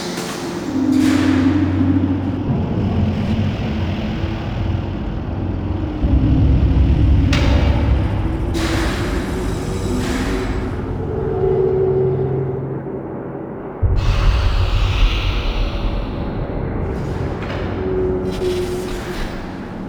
Interior Bed Loop, Ambience; Warframe; Entrati

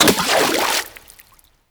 Fishing Spear Water Impact; Weapons; Warframe; Eidolon Fishing Spears

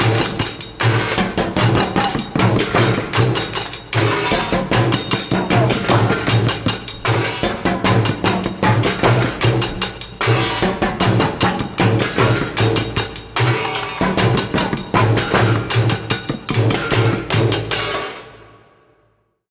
Vent Kids Hideout Music; Skeg

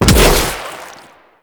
Infested Arm Cannon Shot; Weapons

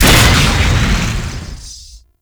Shock Rifle Fire Alt; Warframe; Weapons; UT Weapons

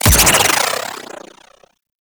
Redline Projectile Launch; Warframe; Runner

